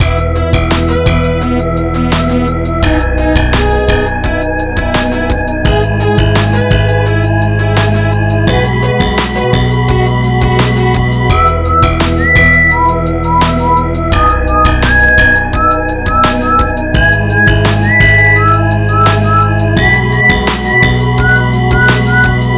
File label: realtek midi double mashup; Roblox Doom